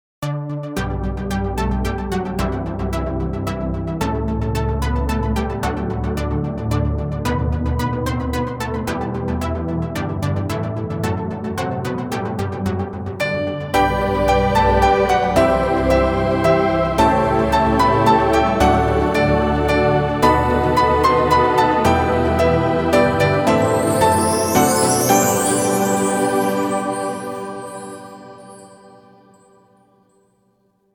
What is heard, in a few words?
We wish you a merry christmas